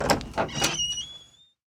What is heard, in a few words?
door open; Golden Crayon Sounds